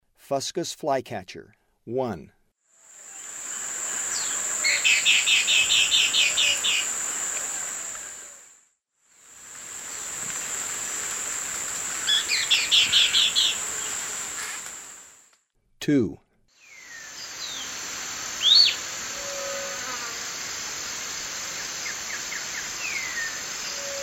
Fuscous Flycatcher; S. America; Eastern Lowlands

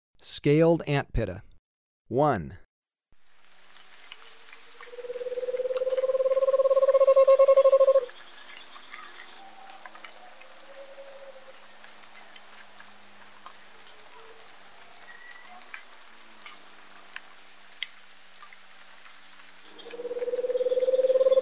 Scaled Antpitta, Birds of Ecuador; S. America

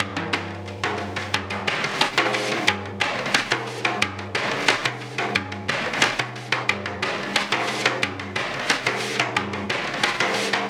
ZERO GRAVITY BEATS

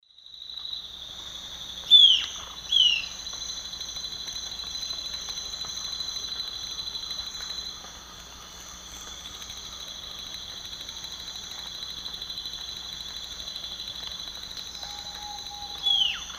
Corythopis torquatus, song; S. America; Eastern Lowlands

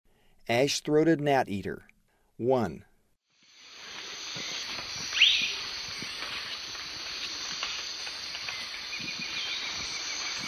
Ash-throated Gnateater; S. America; Eastern Lowlands

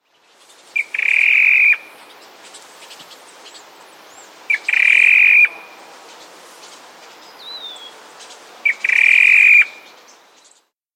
Rufous Antpitta; S. America

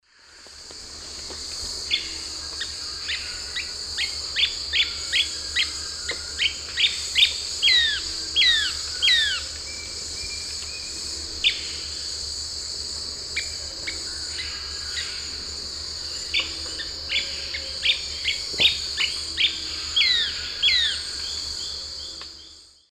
Mionectes oleagineus, calls; S. America; Eastern Lowlands

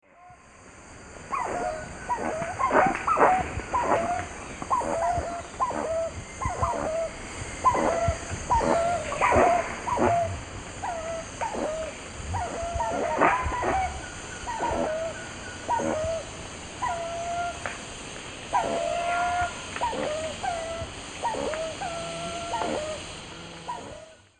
Penelope jacquacu, song and calls; S. America; Eastern Lowlands

